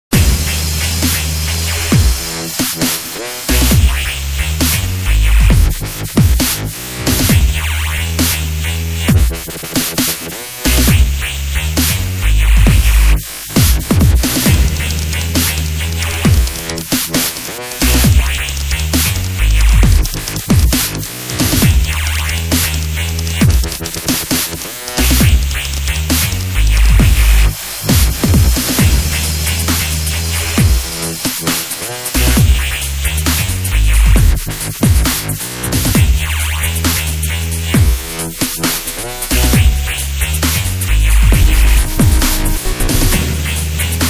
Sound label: dubstep mix